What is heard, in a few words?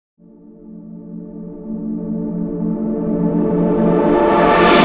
Tense Swell; KJ Sawka - Drum n Bass & Beyond; Swells